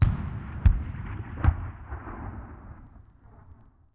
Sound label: distant gun; WAR